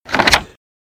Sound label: page turn